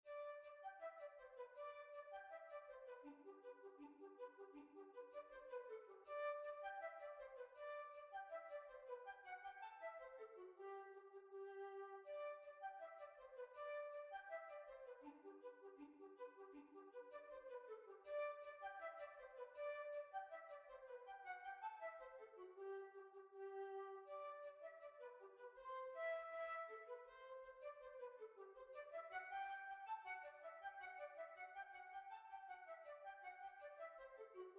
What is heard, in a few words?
Holywells Hornpipe